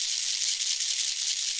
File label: SHAKER, Ethno Techno; ILIO - Ethno Techno